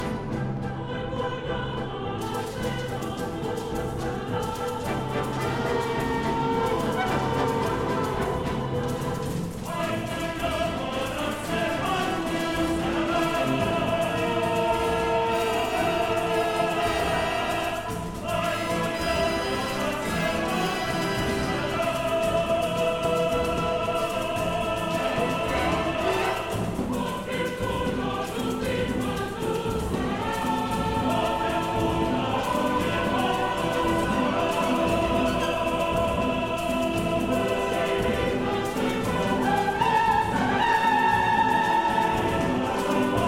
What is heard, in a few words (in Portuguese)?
reco-reco e chocalho percussao